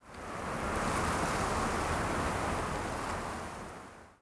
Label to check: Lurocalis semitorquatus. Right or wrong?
Wrong